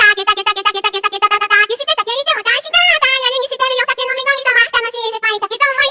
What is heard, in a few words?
Vo Main